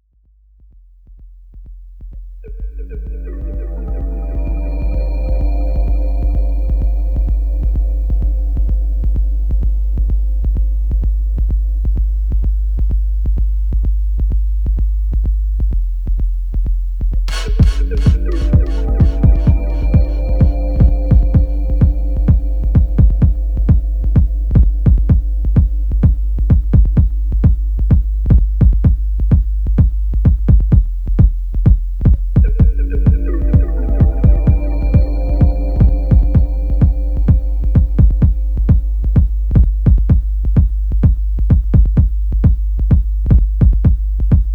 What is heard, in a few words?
Drum